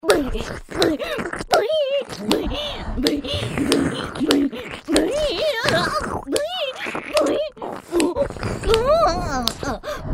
ブヒ声喘ぎ＋耳舐め（パンパンSE付）; エルフの女王、メス豚堕ち

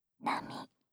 波, ASMR; シャッフル睡眠ランダム再生用; 無声音ASMR; シャッフル睡眠法で眠ろう; バイノーラル音声

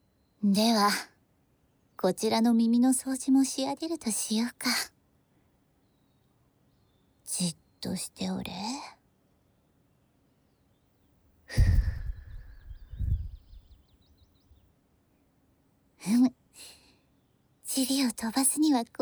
風を起こすなど造作もない（耳吹き右）

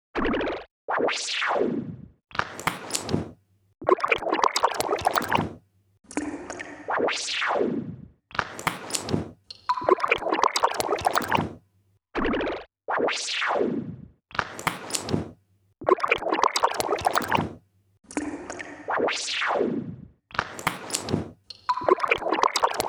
独特なムーヴが官能にも似た期待を高める (右); エロティカ

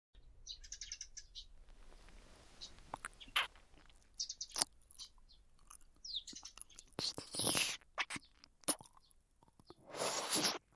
ねっとり耳舐め; おはよう代わりのねっとり耳舐め。

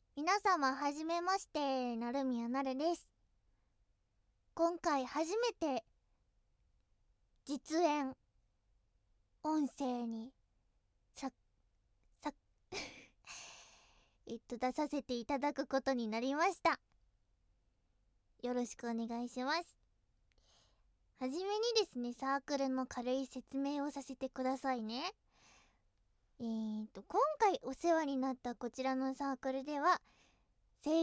実演; ほろ酔いで感度良好なロリ声コンカフェ店員

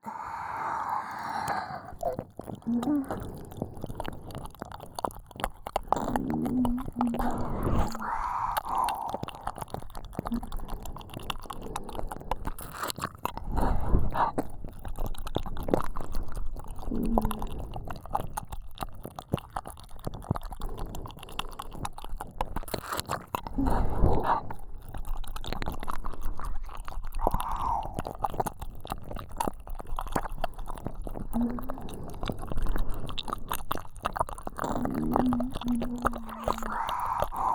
耳舐め; 女教師達にマゾバレしてはいけない絶対射精禁止のメトロノームリズムオナサポ♪

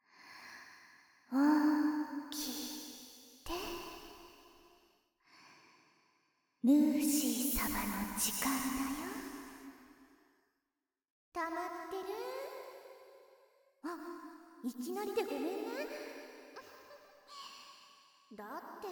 W耳舐め手こき; SE無し; 夢囁き版; いたずらっ子なJKサキュバスの8分身で甘～い夢囁きトランス搾精; 脳イキ+トランス

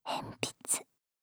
鉛筆, ASMR; シャッフル睡眠ランダム再生用; 無声音ASMR; シャッフル睡眠法で眠ろう; バイノーラル音声

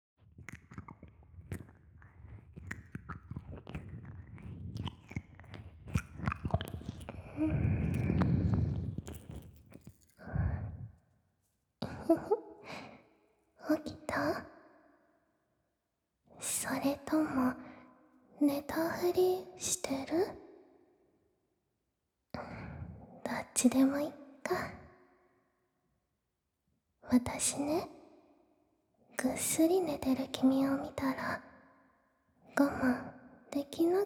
耳舐め 手コキ で ゾクゾク, ASMR

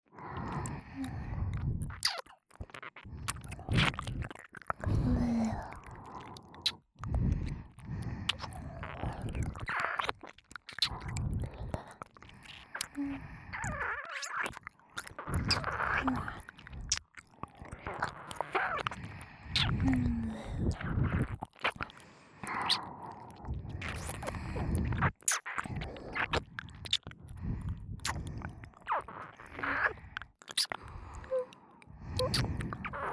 優しめ両耳舐めループ音声; 年上女性に甘やかされて絞られるママ活性活

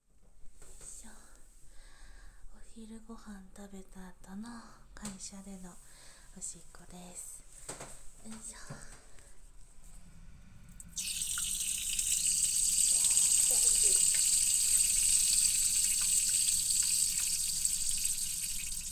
昼食後の会社のトイレでオシッコ

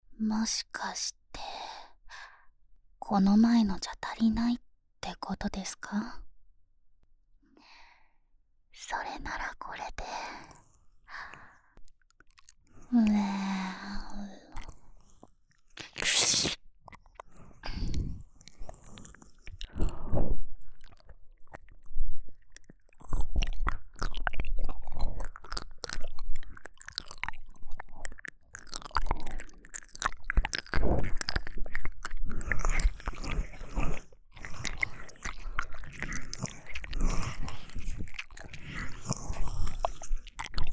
W耳舐め されながら じゅるじゅる フェラ＆セックスする音声

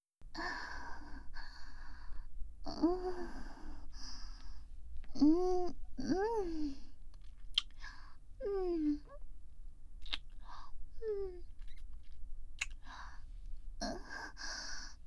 キス; 【マゾハーレム】

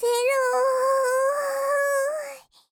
喘ぎ声; カウント０; カスタマイズ用音源

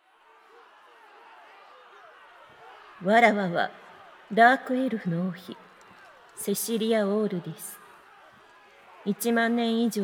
ディープキス 絶頂; ダークエルフの王妃、セシリア・オールディス; KU100; オホ声特化

